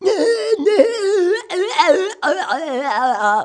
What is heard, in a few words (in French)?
bruit; MOBICLIC41